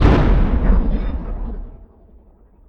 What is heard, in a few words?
alien door; Artifacts; Subsurface; Barotrauma